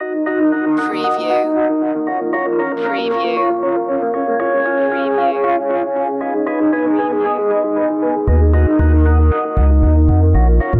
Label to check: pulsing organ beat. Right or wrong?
Right